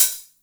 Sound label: HAT; E-MU Formula 4000 Series Vol. 2